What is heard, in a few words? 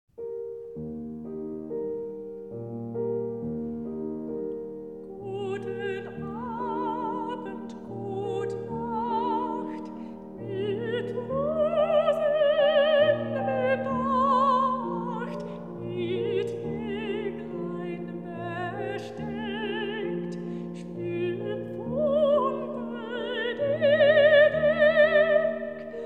Lullaby Cradle Song; Brahms; Opus 49